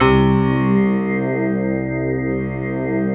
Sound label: VFX bell, synthesizers